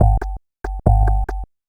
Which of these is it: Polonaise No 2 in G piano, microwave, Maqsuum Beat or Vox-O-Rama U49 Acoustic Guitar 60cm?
Maqsuum Beat